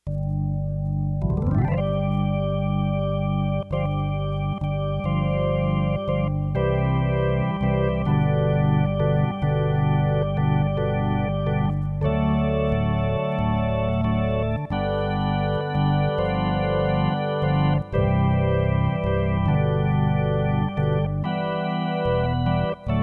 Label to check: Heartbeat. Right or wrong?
Wrong